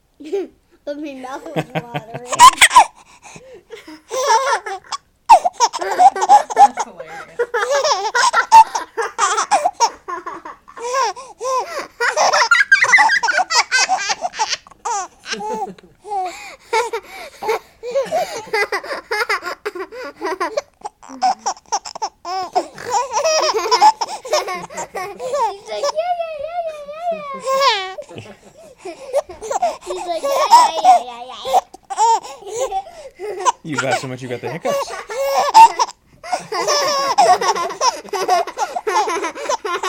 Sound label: laughing